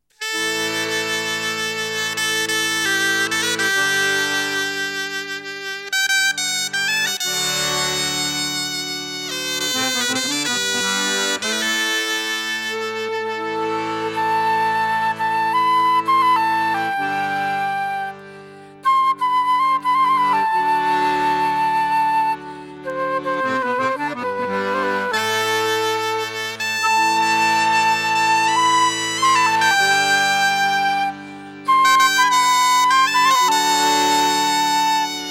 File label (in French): Loudeac Ronde